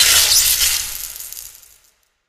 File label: spark, coop electric; player